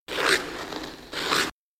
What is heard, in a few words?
Hockey Player Skate